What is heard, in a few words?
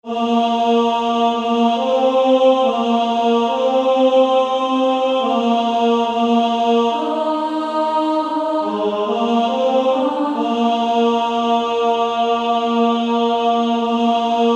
Female Choir; Lead Me Gently Home Father